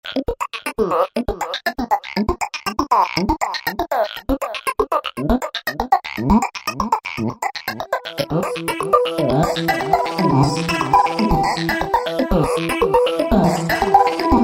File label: Roland V-Synth Wet Balloon; Factory Patches